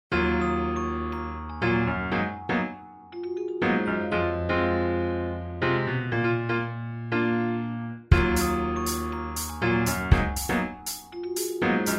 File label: funky